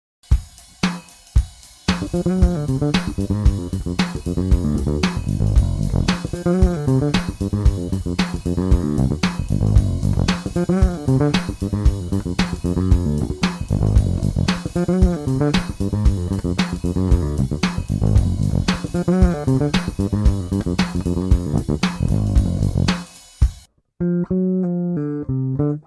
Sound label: Bluesy slides pulloffs